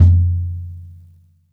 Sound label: bop kick - snares on; JazzFunkKit